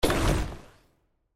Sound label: Rock; Qiyana